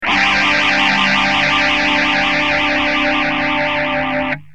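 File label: guitar tc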